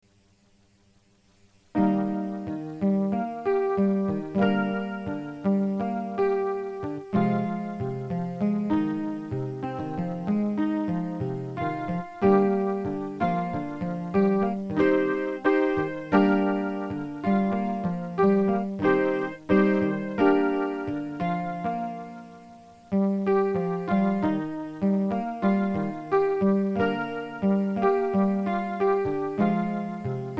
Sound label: inst chorus